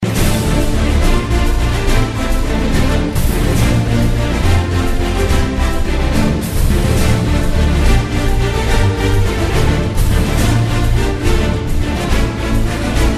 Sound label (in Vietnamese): Âm thanh tiết tấu nhanh dồn dập